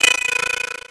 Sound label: Transition Digital Scanner; Adobe; Production Element